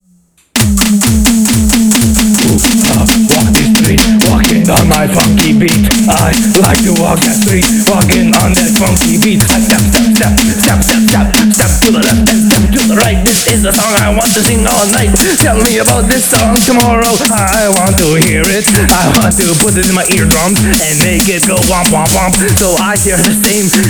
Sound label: walking on my funky beat